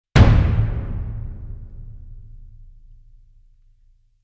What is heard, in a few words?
Single Slam